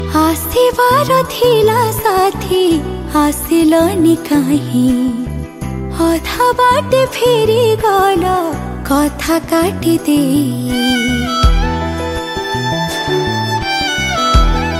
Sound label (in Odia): Odia Sad Song